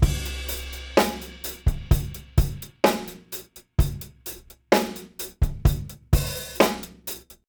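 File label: 64 bpm, beat loops; Zero-G; Monster Beats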